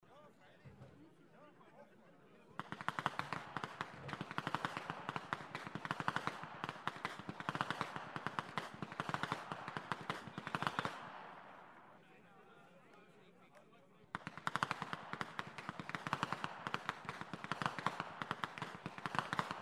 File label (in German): schnalzen, flachgau; MUNTIGL II